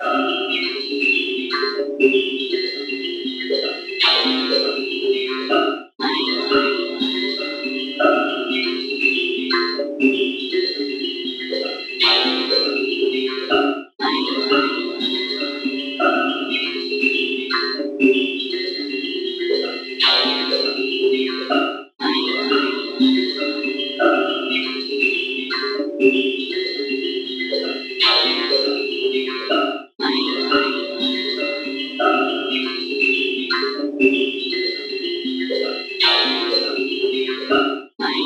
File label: Cinematic Tension Builder